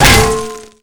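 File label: pan impact robot; melee impacts; Garry's Mod; tf2; mvm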